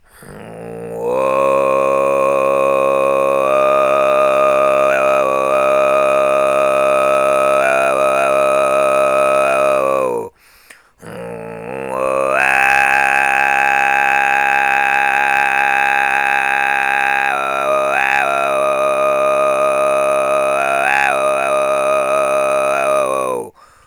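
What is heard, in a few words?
kargyraa, khoomei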